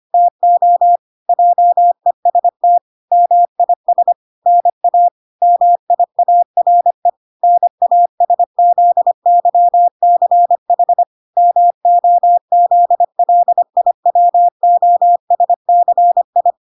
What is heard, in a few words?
25wpm